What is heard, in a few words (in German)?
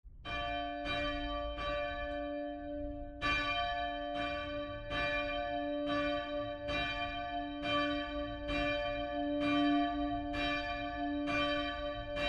Friedensglocke